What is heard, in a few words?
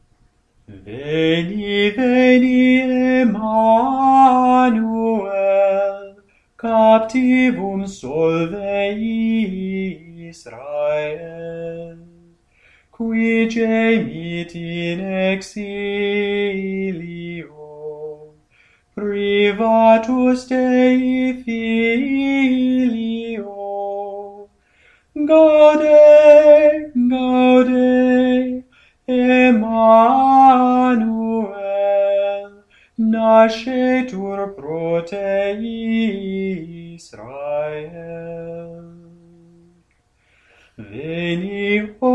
Veni Veni Emmanuel Latin, chant